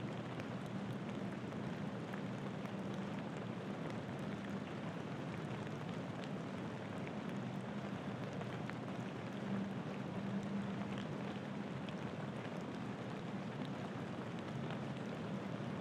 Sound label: rain loop; snakeinabox; double time pitch shift